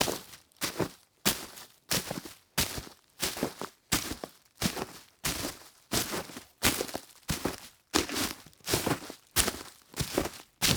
Foley Footstep Work Boots Leaves And Twigs Debris Fast Walking Long; Foley Footsteps